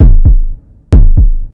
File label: Heart Beat; Syobon Action Halloween Edition; Android